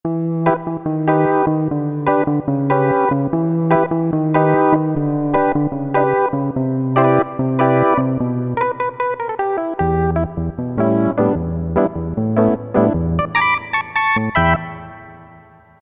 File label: Elec Org 3, Roland MT-32